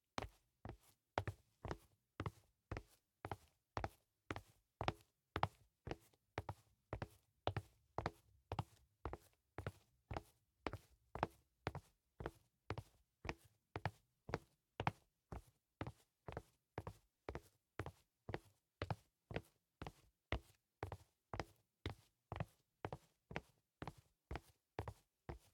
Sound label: Footsteps; LOOPERS; Tyler